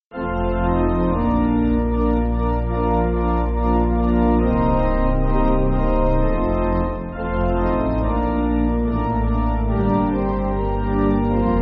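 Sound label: Spiritual, Pipe Organ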